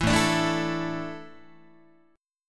A Suspended 4th, Guitar; Asus4; strummed; 4A,4E,5A,5D,5G#,6C#; 7,5,5,7,8,8; JGuitar

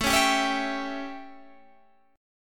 A 7th Guitar Open G, chord; 19,18,17,18,17,17

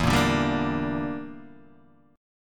E Minor 7th Flat 5th E, Guitar DADGAD

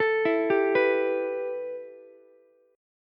A 7th Suspended 2nd A, Ukulele; arpeggiated

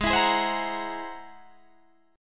G sharp Augmented 9th G sharp Guitar Open D

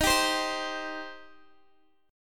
D sharp 6th, D sharp, down, Guitar, Open G

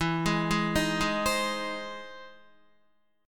E Augmented Major 7th, Guitar; arpeggiated; 4F,4A,5C,5G,5C,6E; 11,11,8,8,8,8

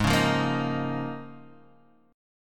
C sharp 6th Add 9th G sharp

